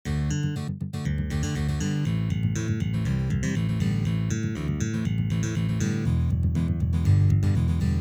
Fank

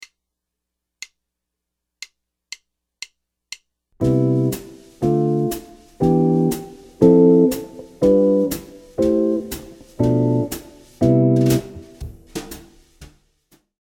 Dzhazovaya posledovatelnost akkordov

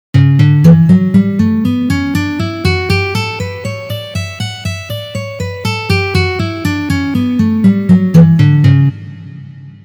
Gamma Re mazhor Tri noty 2 pozitsiya

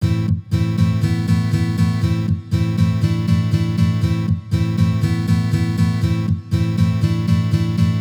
Posledovatelnost Asus2

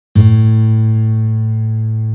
Pyataya struna gitary

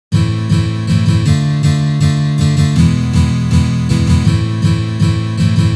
boj na gitare

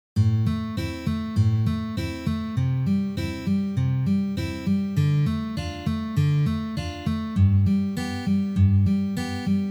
Primer akkompanementa Arpedzhio

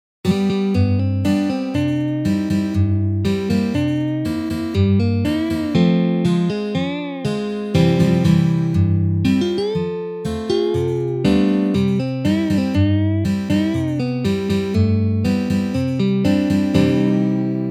Kantri

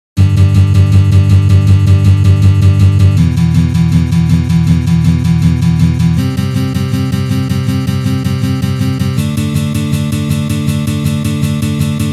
Uprazhneniya Rok na gitare

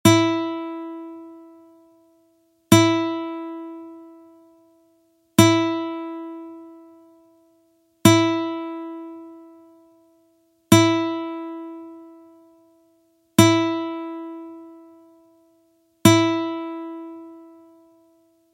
struna Zvuki strun klassicheskoj gitary

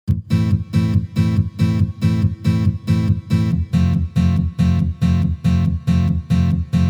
boj na gitare

